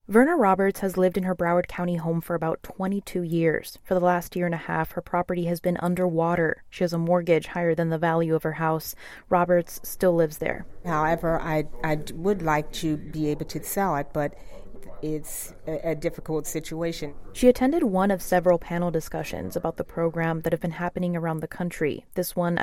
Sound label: HARP Mix